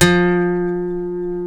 G, funk guitars

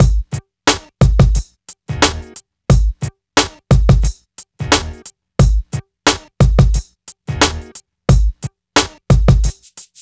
drum, Hip Hop and R&B Drumloops; Best Service ProSamples vol.01; AKAI

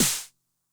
Low Tune High Tone, 909 snare drum; classic machines; 909mod

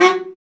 BRA BARI, Bariton Sax

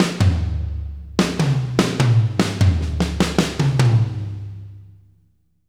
Fills 75; Zero-G; Monster Beats

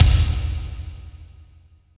KICK, Tekno Industrial; East West

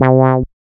SYN MC WAH, Roland MC-202

